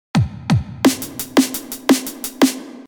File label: drum loop; waves ircam